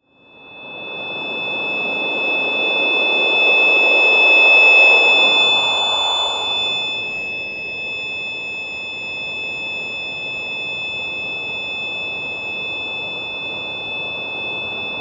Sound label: sound design texture alien signal; Sound Design - Dark Science Fiction / Alien Texture With High Pitched Shrill; Strange Tones